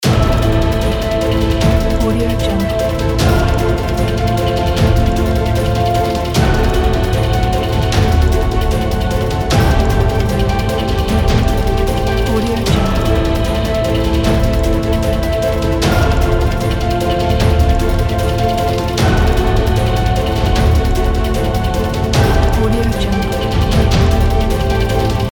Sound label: epic magic tension loop